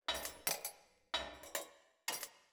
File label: metal pipe